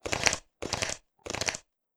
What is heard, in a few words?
card shuffle